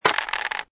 reload crossbow; Casanova

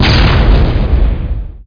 fuel drum; Spec Ops 2

